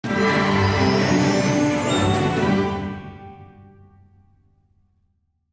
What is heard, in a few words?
Re Spin Won Celebratory Tune; fire Wizard; Axial